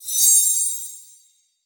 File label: Explosion Ice Sparkle; boomerang fu